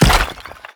imp stone

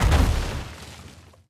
shoot down

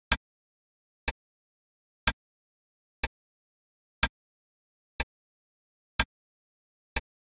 clock

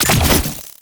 flash move

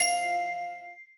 ui card bounce

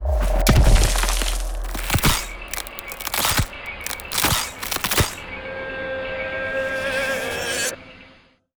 skill ghost reborn

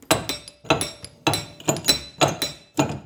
fix car jingle

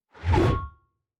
two handed blunt

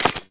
thunder

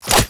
crossbow

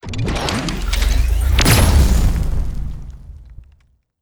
earth impact

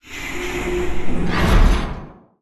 rolling door

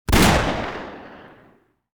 gun single

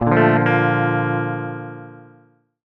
electric guitar

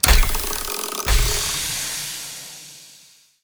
bat poison open, ambience

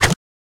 whip

